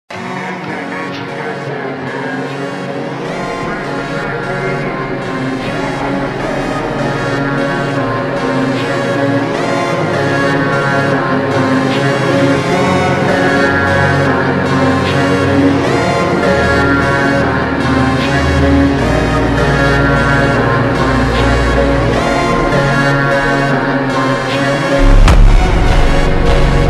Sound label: funk super slowed reverb